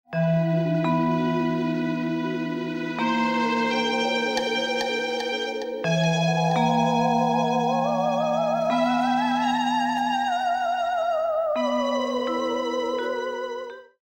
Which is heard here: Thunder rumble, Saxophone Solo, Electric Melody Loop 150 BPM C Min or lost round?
lost round